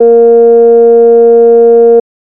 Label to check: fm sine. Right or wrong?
Right